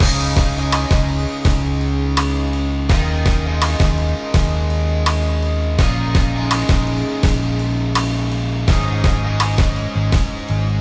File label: BGM lobby labor; starbites